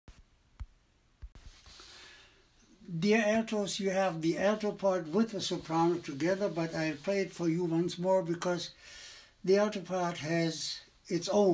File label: Schubert, Agnus, Alto; rehearsal